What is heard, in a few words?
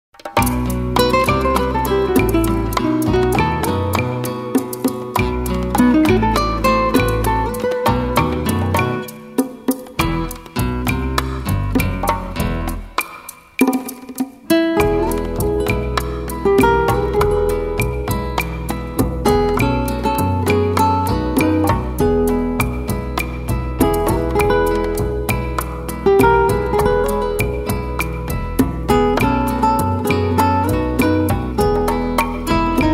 guitar